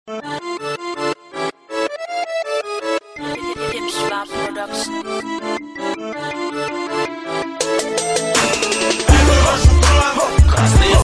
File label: russian crunk ringtone